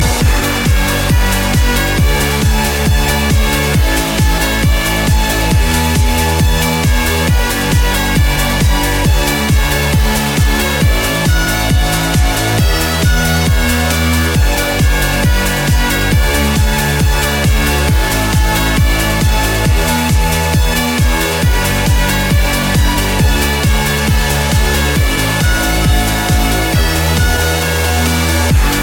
Nice Electro Trance

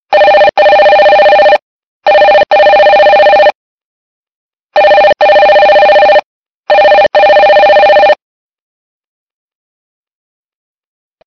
Loud Ringing

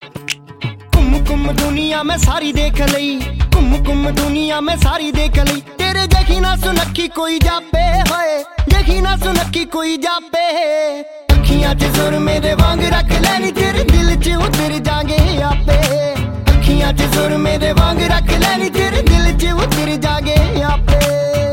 Sweet Punjabi Song

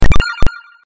Bonus

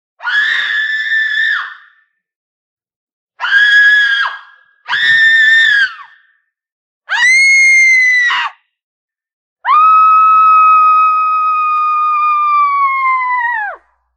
Screamwoman

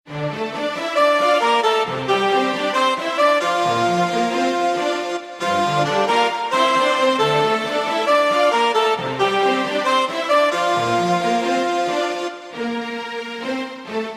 Orchestral

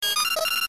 Bleep

